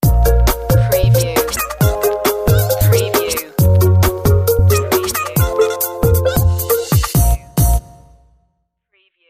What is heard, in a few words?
glitch pop sting